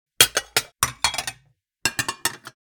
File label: Kitchen furniture stacking plates